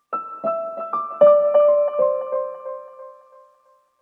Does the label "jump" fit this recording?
No